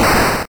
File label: shoot, attack sound; カタナ１